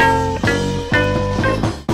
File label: bboy fanfacre